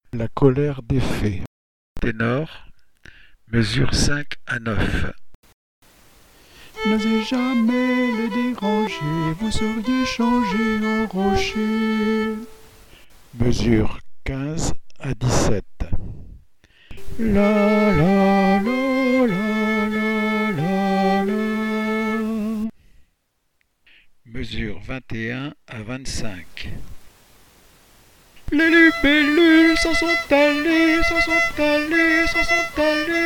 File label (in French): La Colère des fées (voix) Ténor